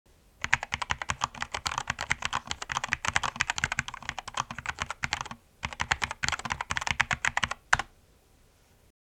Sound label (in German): Tastatur Schreibgeraeusche